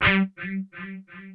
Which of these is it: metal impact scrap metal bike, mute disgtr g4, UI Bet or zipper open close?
mute disgtr g4